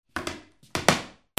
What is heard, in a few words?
SQUEAKS